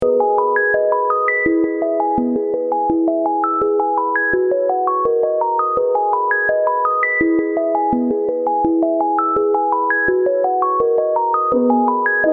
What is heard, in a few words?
space overworld snow globe alt instruments